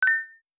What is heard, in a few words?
Direction Up Haptic; i OS; iOS10; nano